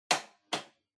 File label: Washer Front Load Noise Unlock